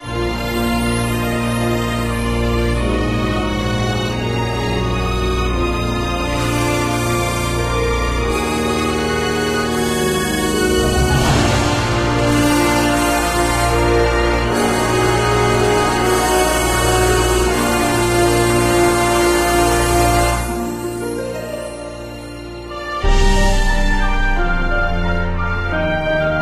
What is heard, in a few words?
orchestra; Drago Noka; PS4; bgm maoudamashii